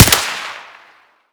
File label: ak47 suppressed fire; weapons